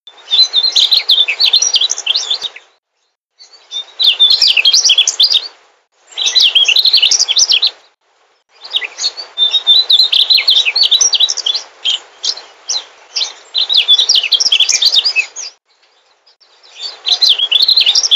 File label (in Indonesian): sikatan mugimaki song